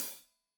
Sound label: Hat Loose, Hi-Hat, Cymbals Stereo